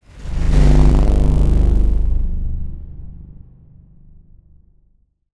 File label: electricity hum low; SoundsCrate